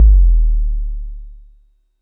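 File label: vermona drm1 mk3 bass drum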